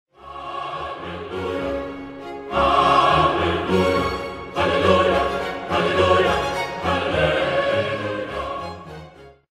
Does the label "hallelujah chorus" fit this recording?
Yes